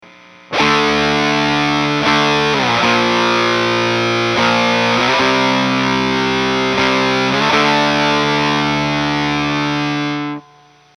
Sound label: Chord 1 Dry